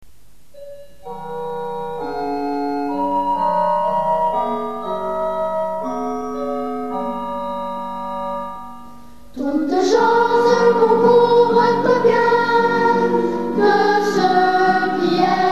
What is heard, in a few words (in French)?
chant toutes choses concourent au bien